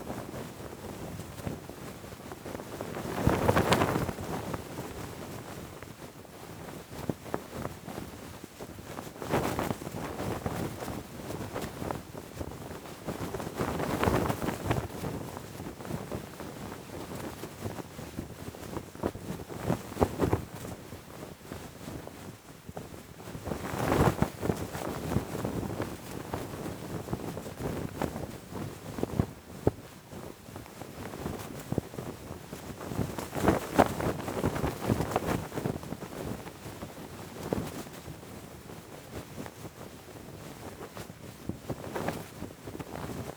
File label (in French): vent voiles